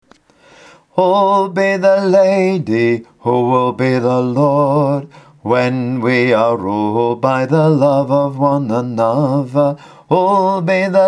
bass

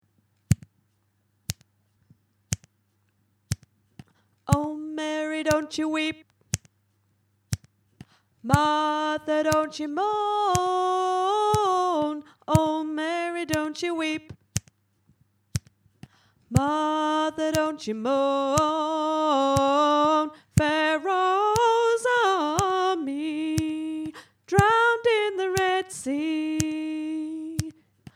alto, gospel choir; oh mary dont you weep

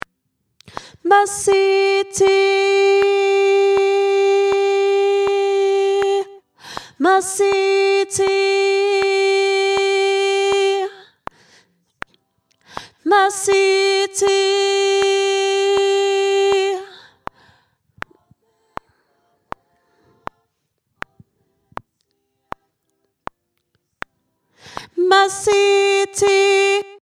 Sop Solo; Amen Siakudumisa

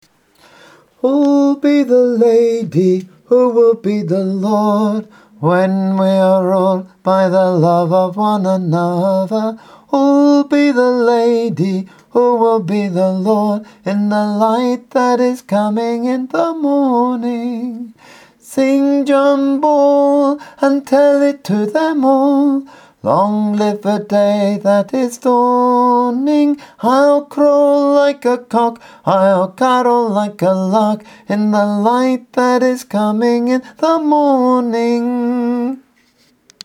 tenor

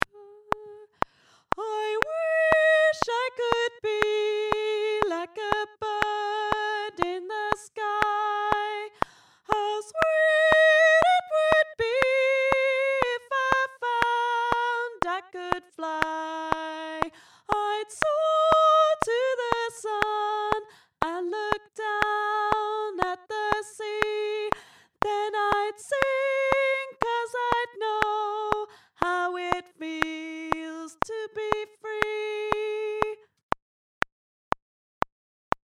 Soprano

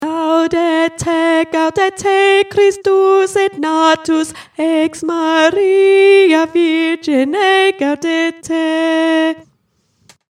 Alto; Gaudete

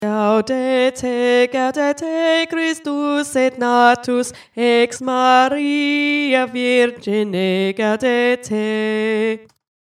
Tenor; Gaudete

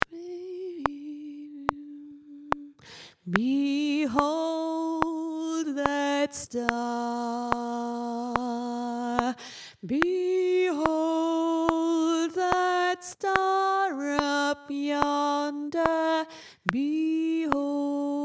Alto, gospel choir; behold that star

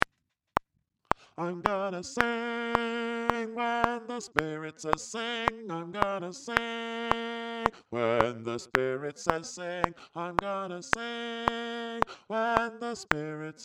Bass, gospel choir; Im gonna Sing

